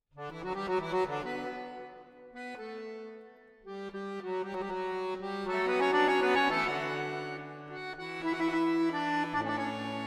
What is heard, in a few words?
Fugue in D major